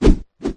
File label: stand pistol; halo; flood; elite